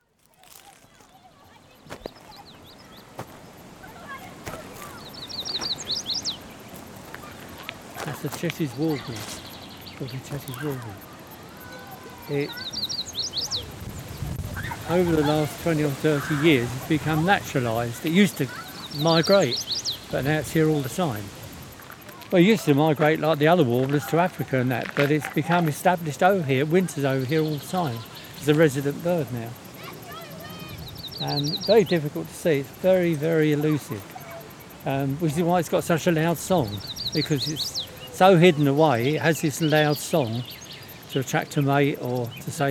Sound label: Norman Canal Birds